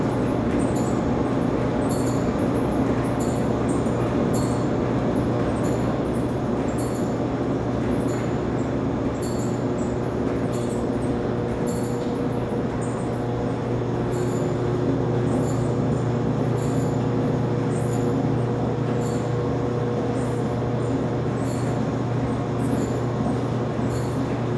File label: laundry room, ambience sounds